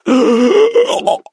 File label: die